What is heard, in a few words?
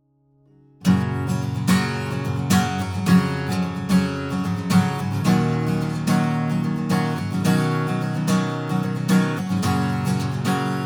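guitar strum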